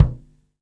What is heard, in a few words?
BD, Drums Acoustic; USB Soundscan vol.10; AKAI; 90s sample CD; ALL BD